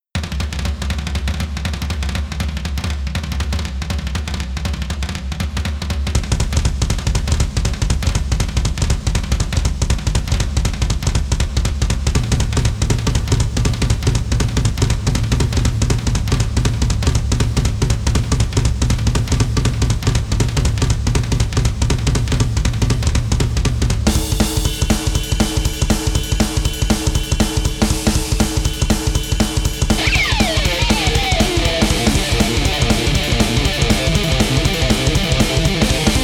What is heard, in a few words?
Instrumental